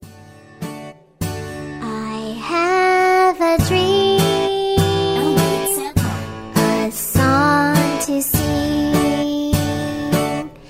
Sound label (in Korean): 영어 여 노래